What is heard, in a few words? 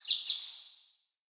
Bird Double Chirp; Pothia Bird